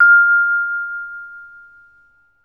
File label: VB VIFS F5-L, VB VIB FS; Best Service; Peter Siedlaczek's Advanced Orchestra